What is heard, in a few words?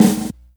reverb acoustic snare A key, snare single hit tuned to the A note